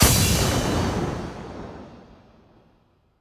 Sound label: big win txt change; jackhammer